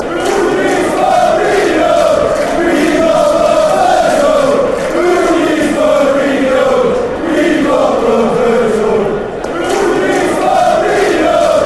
who needs mourinho, fan chants; swansea

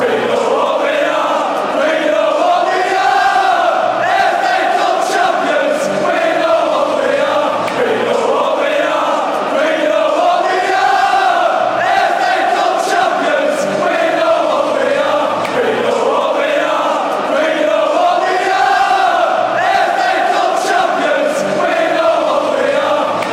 fa cup champions we know what we are fanchants

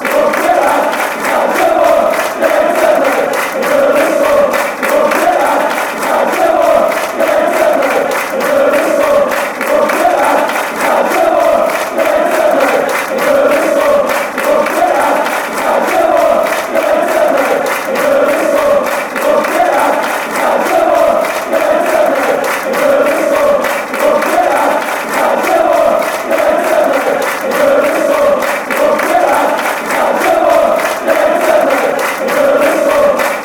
burnley scum fanchants

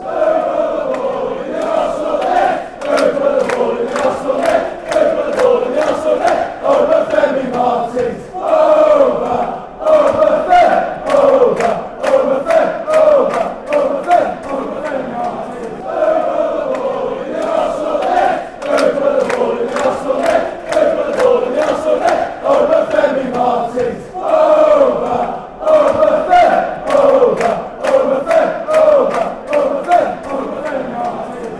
who put ball arsenal net fanchants

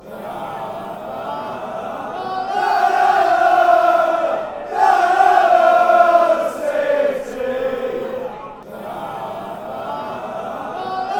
city fanchants

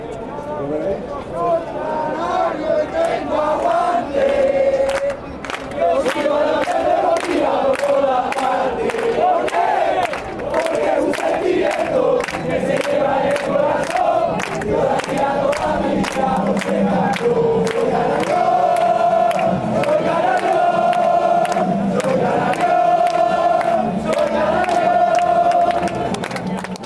yo soy canario y tengo aguante fanchants

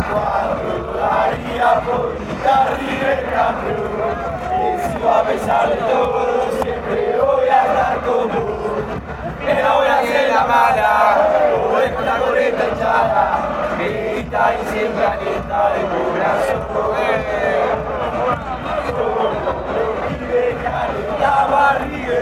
screaming and always encouraging, chants

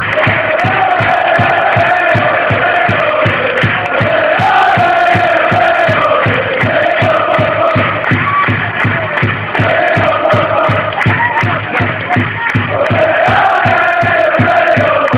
staying up fanchants

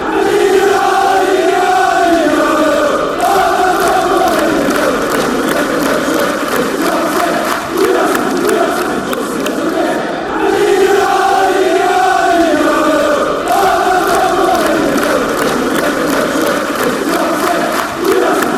eieieio, chants; city

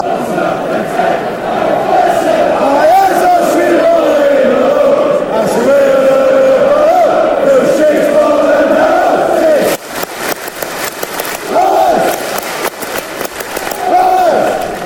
molly malone fanchants; drfc

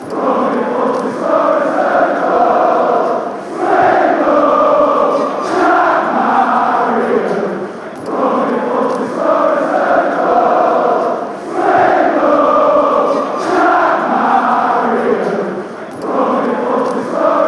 swing low, fan chants; jack marriott

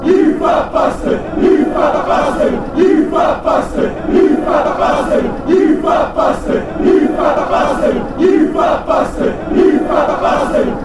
you fat fanchants

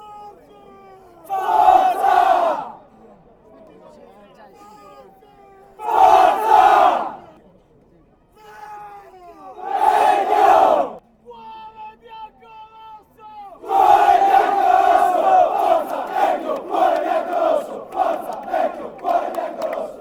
come on fanchants

